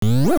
Pick Up; Link picking up an object

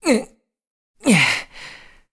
Link Carry Stamina Low; Link carrying a heavy object at low stamina; Skyward Sword

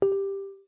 Text Continue; The Wind Waker; Continue a dialogue

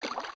Steps Deep Water; Zelda; OOT

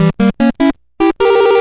Fish Swallow; The giant fish swallowing something; zelda

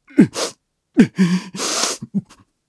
Good News Crying; Groose discovers Zelda is okay, begins crying; Skyward Sword

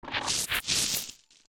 Bush Walk; zelda; OOT

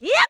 Link Jump; The Wind Waker; Link jumping

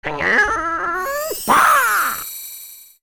Magic; Tingle; Zelda; MM

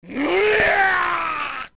Ganondorf Energy Release

